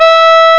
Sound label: string; medusa; Amiga Soundtracker Sample Packs